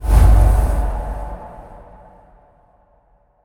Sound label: shadow teleport; yami